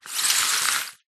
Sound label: household magazine glossy slide wood